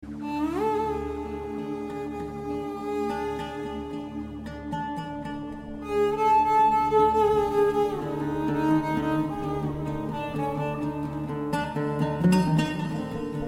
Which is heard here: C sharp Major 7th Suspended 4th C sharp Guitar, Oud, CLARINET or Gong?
Oud